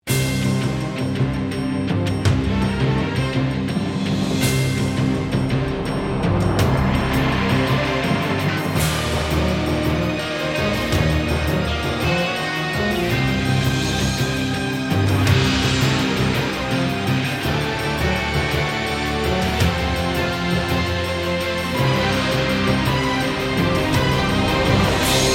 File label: Epic Battle